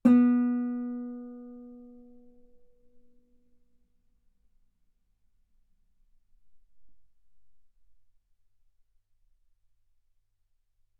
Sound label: Guitar B3; ff; sul B